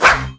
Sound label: Swing; Murasama